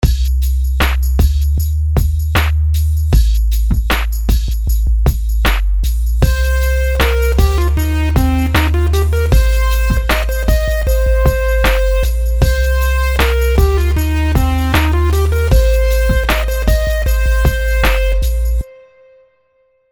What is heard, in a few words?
rhythms simple 16ths